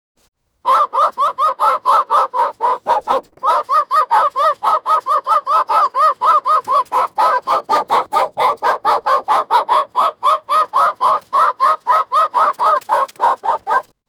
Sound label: cartoon chicken run; movement